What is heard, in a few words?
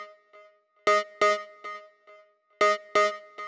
Manhattan Kit 138BPM Blip Synth WET, G# minor; Melody & Synth; Vengeance Samples